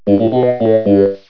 FUNKY; Alert Beeps; Beep Sounds

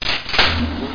ELEV

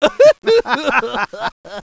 Laser Lab Sound

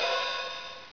OPEN HI HAT; Dark Forces Demo; Dark Forces Instruments

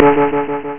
Ammo; Orb Mazez 1.0b1

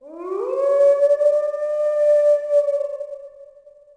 animal; DAoC; Toa

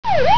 figure eight flyer appear; F.A.R.M. Patrol; MacEasy Magazine CD - Issue 18

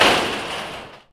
Trash Can; UrbanChaos

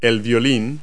violin; Multi-Lingual Talking Picture Dictionary

